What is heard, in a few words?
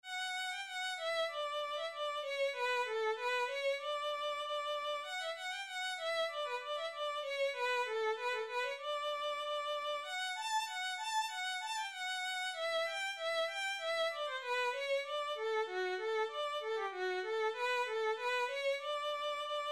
Kemp Violin